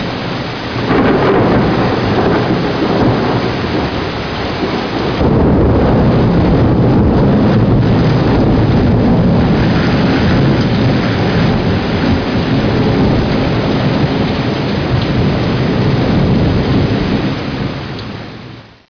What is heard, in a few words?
thunder